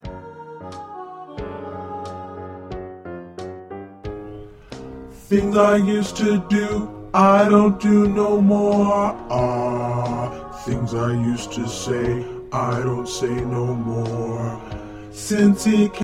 SATB Bass

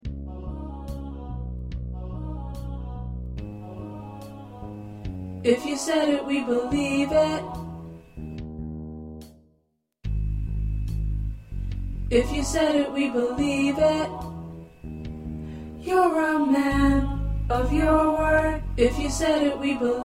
Tenor

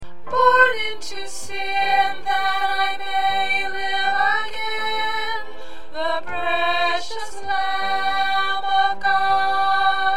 Soprano, choir parts

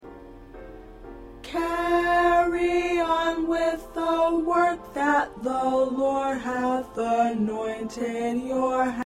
Tenor

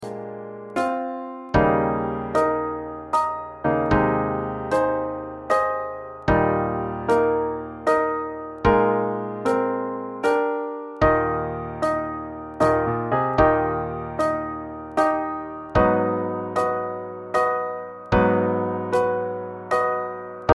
Accompaniment

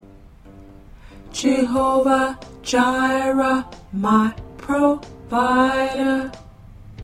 Tenor, choir

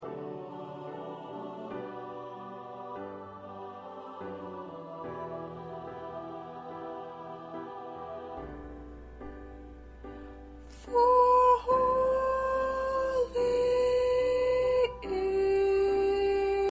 Lead

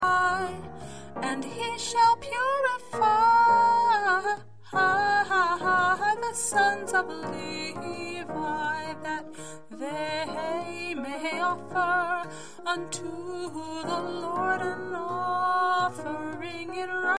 Lead

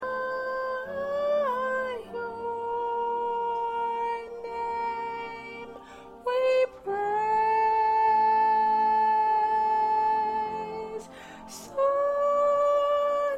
Soprano, choir parts

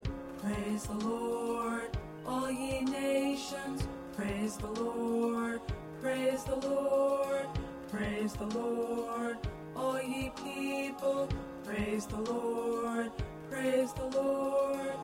Tenor

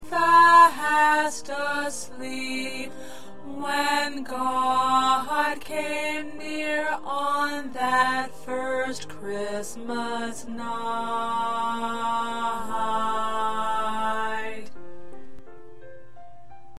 Alto

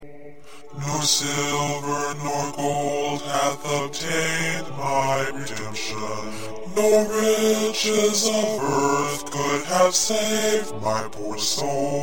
Bass

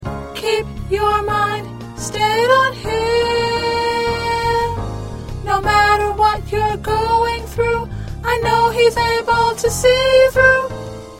Soprano, choir

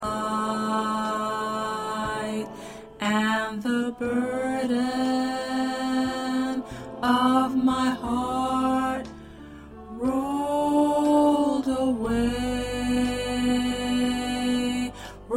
2nd Tenor

